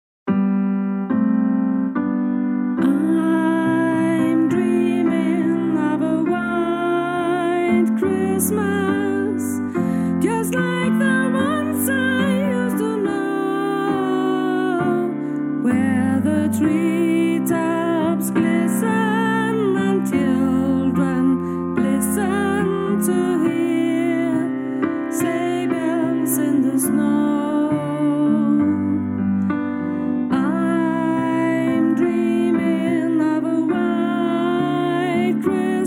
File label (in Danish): sopran